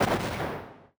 explosion; CrossCode

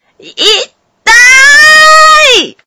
damage

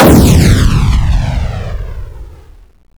gun; madcars2; radicalplay

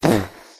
fart

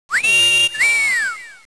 catcall; 2fast2curious

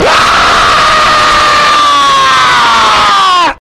scream long

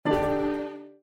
Combo Boom; Kung Pow

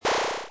equip; doublespeakgames

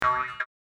Saut frog

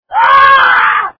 death monster; demolition 2

